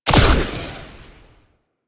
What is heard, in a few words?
Disc Shot Explosion; Disc Moa